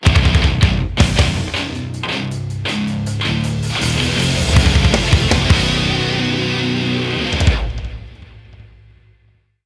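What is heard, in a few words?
Multiplayer Match Win; modern combat 3 fallen nation; android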